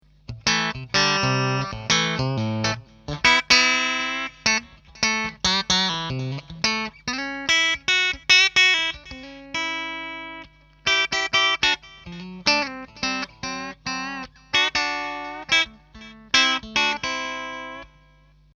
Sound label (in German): clean steg